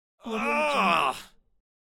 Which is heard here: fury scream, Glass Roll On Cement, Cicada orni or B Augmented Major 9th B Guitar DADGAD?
fury scream